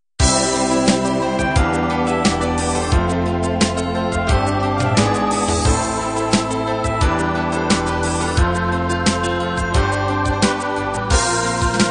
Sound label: cinema ballad end